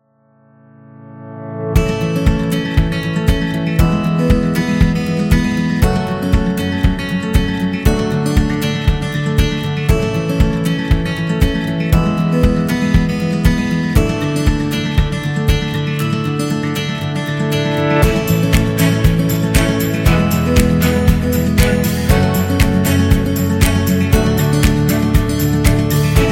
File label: Romantic Upbeat; corporate background